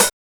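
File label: hi-hat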